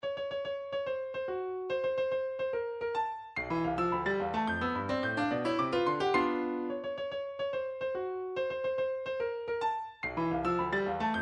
ten short piano pieces, midi; shostakovich

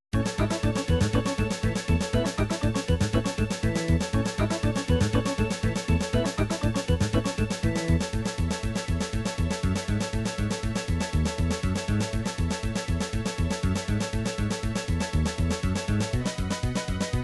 folk srbije kolo, midi

